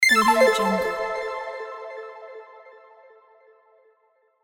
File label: mystical arp